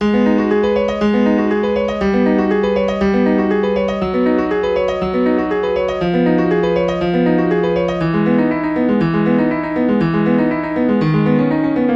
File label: fortepiano arp